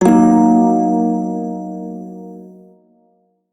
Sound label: Prime Shawzin Yo Chord, Music; Warframe